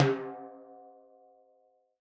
timbales Low Edge; Raw Perc One Shots; Slate; SD SEISMIC Drum Sample Pack

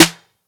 snare light; one shots; SEISMIC Drum Sample Pack; Slate

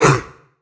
perc aye; Drum Sample Pack; One Shots; Slate; SEISMIC

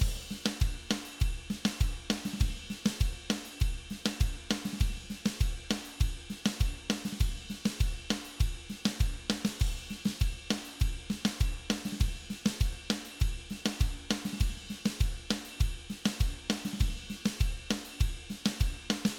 Cumbia Chorus

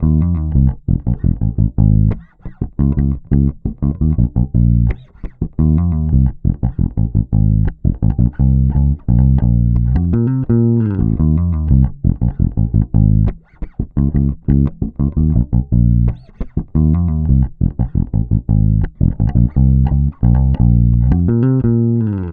Bass loop 86 Fm, Electric Bass; Electric Bass Loops; Shark; Slate Digital BASSHOLE Sample Pack

